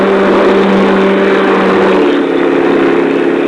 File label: CAR DRIV; trasporti; auto